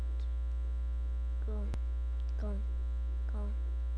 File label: gun